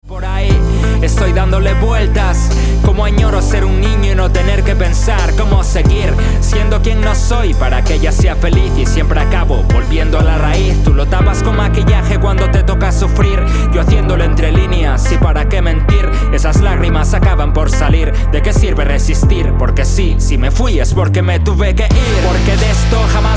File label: Hip Hop